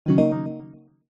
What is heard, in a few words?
game sound soft warm digital tone positive select